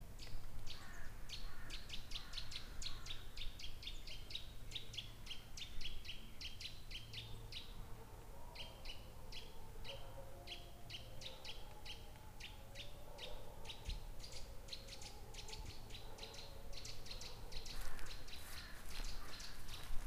oiseaux chiens

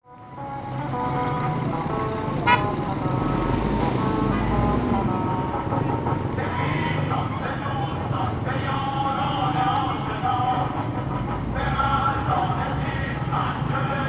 chant révolutionnaire

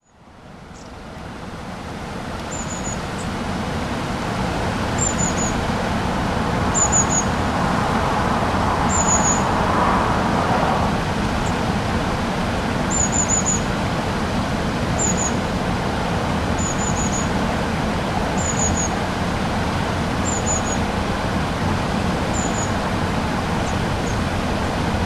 Mesanges a longue queue; MZ-N710; moulin Gaud

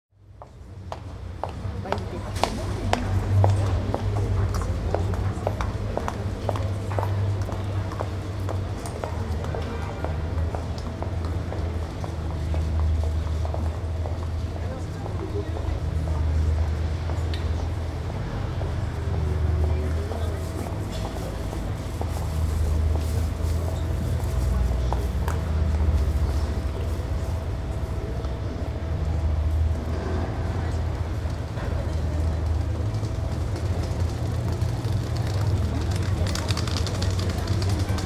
chantier

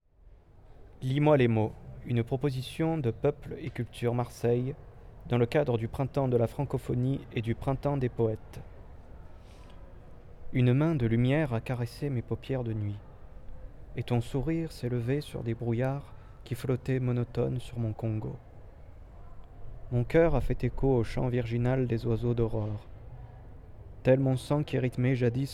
chants pour Signare Senghor

